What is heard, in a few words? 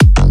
Kick Bass F